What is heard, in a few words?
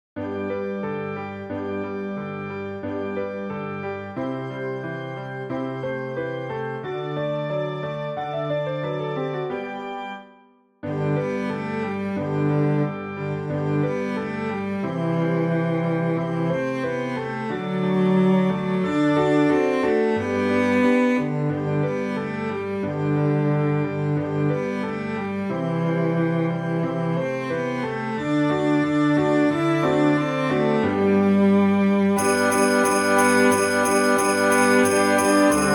cello music; Pierpont; Jingle Bells; ジングルベル